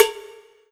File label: Korg TR Rack Standard Kit Cowbell